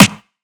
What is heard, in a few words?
sizzle snare g key